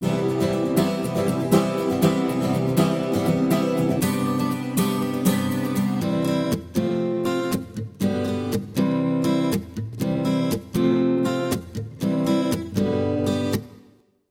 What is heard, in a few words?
Reverb